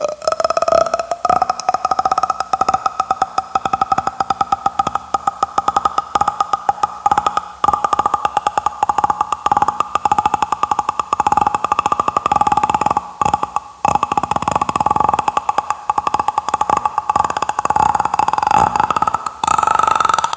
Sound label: vocal fry; Voice; ooh ahh; human